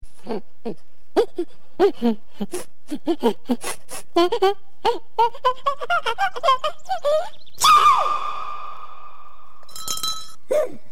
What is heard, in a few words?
sneeze